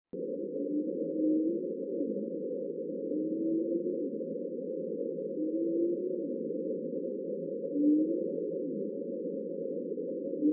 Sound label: humpback Up Down Song